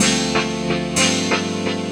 E PIANO 125, Keys; Houseworx

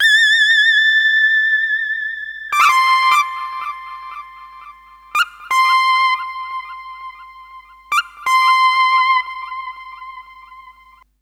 SYNT; USB Soundscan vol.31; Miro s Grooves; AKAI

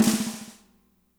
MED SNR, natural kit

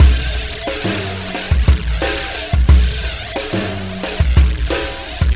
System Overload Beat, Processed Drumkits

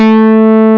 A4, SYN ANA5; Best Service Gigapack 1

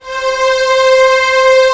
STR C6 FF L, STRNGS, Orchestra; Sound On Sound - Peter Siedleczek's Orchestra

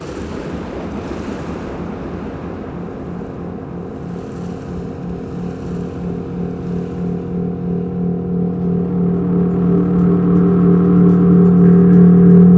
thunderbuilder; Sonic Foundry (Sony Creative Software); Ugly Remnants VOL-2; UR 2 FX (Long)

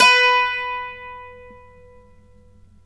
b3, Mandolin; Terkelsen

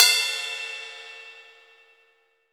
RIDE, ROCK RIDE CYMBAL

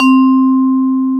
C-3, VIBRAPHONE; AKAI S-Series CD-ROM Sound Library VOL-7

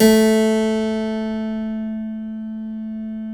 A 2 CLAV; Keyboards Acoustic; AKAI; USB Soundscan vol.17

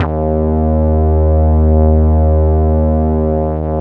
PL MOOG; Wizoo - Powered Nord; STEREO LAYER

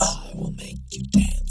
Vox FX; Sonic Foundry (Sony Creative Software); Electrocution

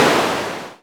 Claptrap, ANALOG; Roland MV8800; ANALOG CRAZE

